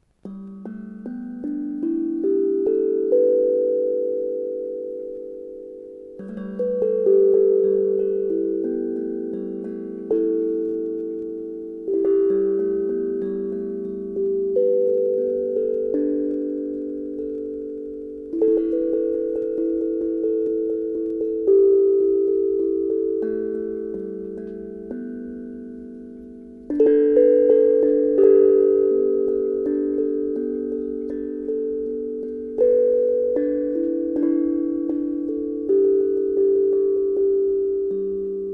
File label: Harmonic G3 440